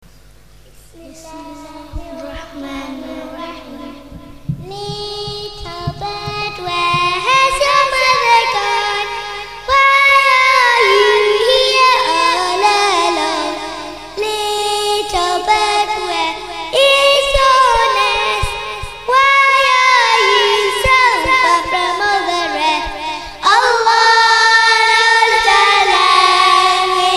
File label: Little Bird Nasheed